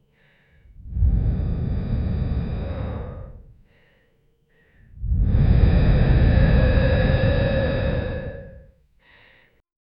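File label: heavy breathing of survivor; drama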